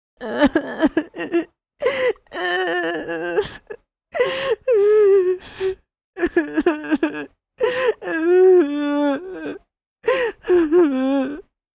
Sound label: Crying anime boy vocalization